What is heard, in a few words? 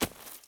dirt